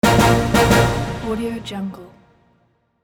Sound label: double orchestra hit stabs